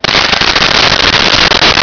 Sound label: pod airbrakes on